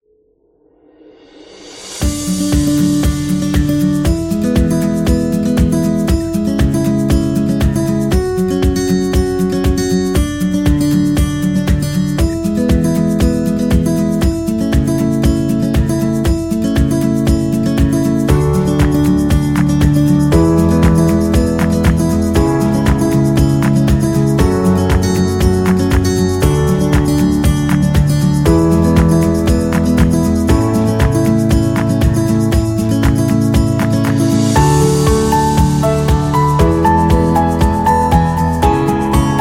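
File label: Animal Cartoon, Kids background music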